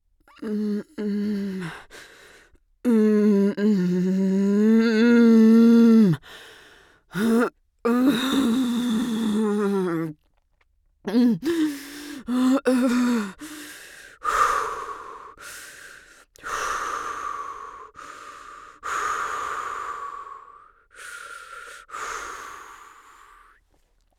woman giving birth light moan

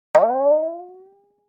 funny spring

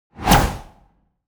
cinematic laser swoosh

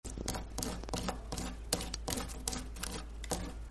door scratch

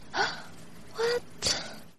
gg shock

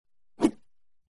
woosh

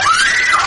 scream; jiafei

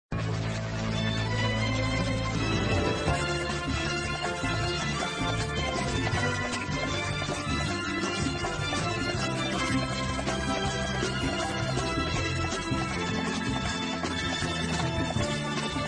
victory meme; rocky

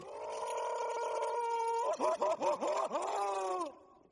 screaming; patrick star

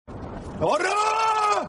freeze meme sound effect

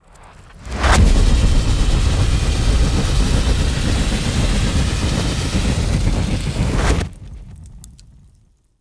long fire beam

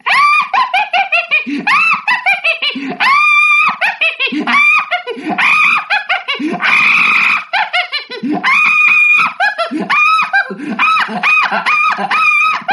silly screaming laughter

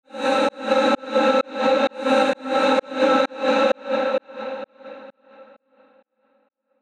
reverse vocal

